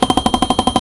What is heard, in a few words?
slot hit a ding sound; sound effect